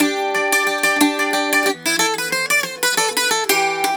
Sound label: Celtic Flavours; USB Soundscan vol.42; AKAI